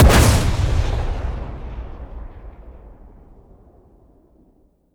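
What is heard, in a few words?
weapon rpapa7 fire player atmo, launchers; gmod; viper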